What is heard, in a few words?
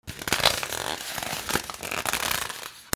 FRICTION